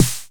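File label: snare drum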